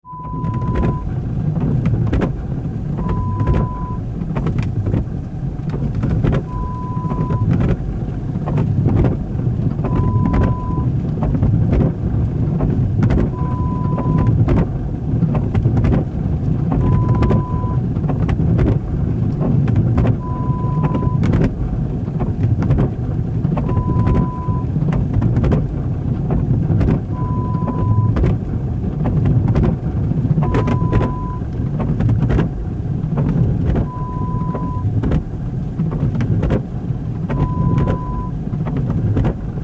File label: wiper sound